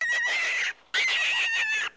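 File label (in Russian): звук зайца короткий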